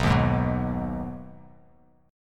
B 6th B down, chord; strummed

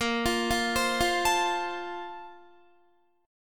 A sharp Major 7th Suspended 2nd, Guitar; A#M7sus2; arpeggiated; JGuitar

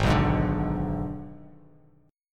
G sharp Diminished, Bass Standard; G#dim strummed; JGuitar

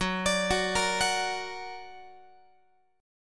F sharp Augmented F sharp Guitar; x,14,17,16,14,17; arpeggiated

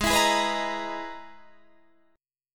E-Major Sharp 11th G sharp

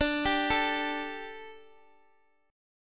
D Suspended 4th D

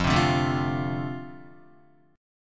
F 6th, Guitar; F6 strummed

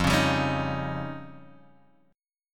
F 7th Suspended 2nd Sharp 5th, Guitar, Drop D

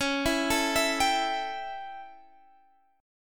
A 7th C sharp, chord

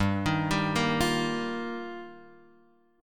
G Minor 7th Sharp 5th; Gm7#5; arpeggiated; JGuitar; x,x,x,0,3,2,0,2

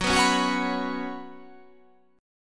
F sharp Suspended 4th

